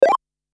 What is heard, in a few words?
Notifications and Buttons